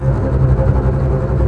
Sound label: TREM STRINGS